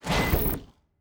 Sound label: Door, Sci-Fi Sounds